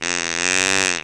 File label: contact call, deer grunting; practical bowhunter